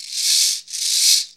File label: RATTLER, PERCUSSION; AMG - Vince Clarke Lucky Bastard